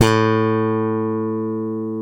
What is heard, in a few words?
E Bass Slap